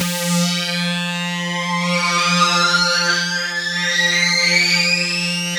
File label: SYN, Drum & Bass, 160 BPM